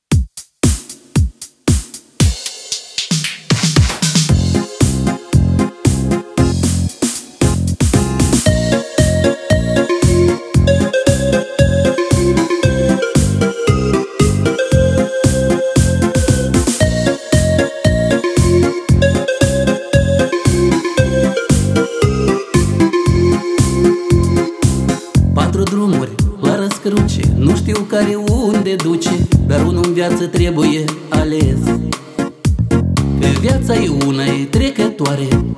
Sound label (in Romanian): muzica moldoveneasca